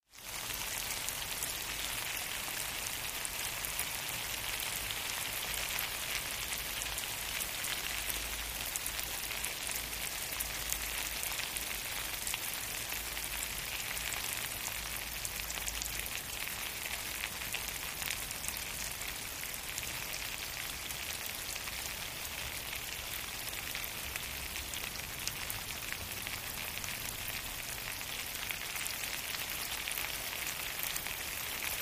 Rain Fall On Foliage

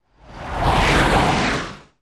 Stinger Sci Fi Whoosh

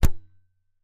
60MM MORT LAUNCH; mortars

